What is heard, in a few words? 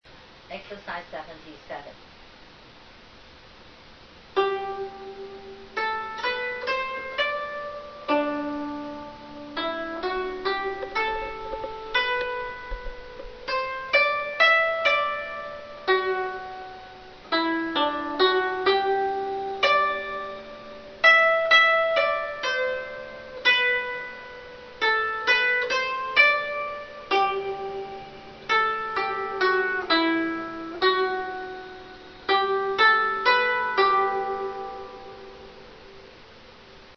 zheng